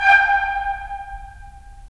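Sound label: brass trumpets staccato, left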